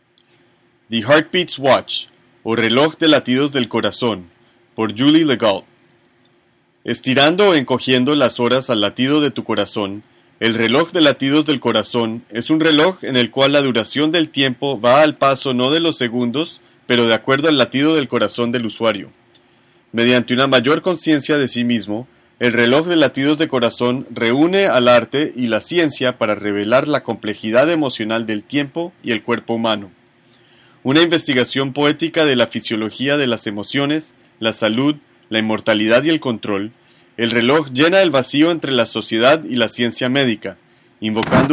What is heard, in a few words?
heartbeats; spanish